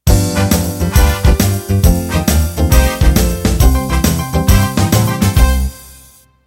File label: 50s Rock End